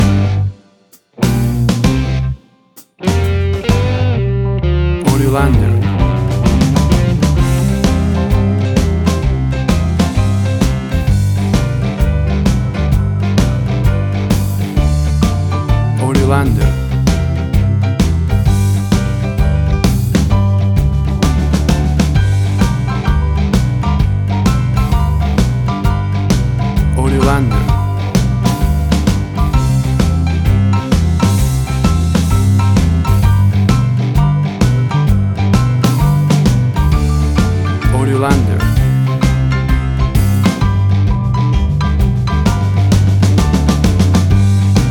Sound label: Boogie Woogie Bar Blues